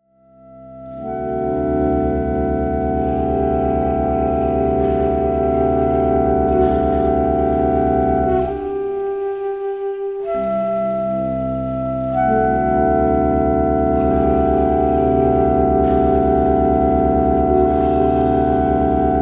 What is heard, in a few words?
Breath Flutter; An Exploration of Powerful and Unusual Saxophone Effects